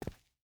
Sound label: footstep boots concrete walk; Rust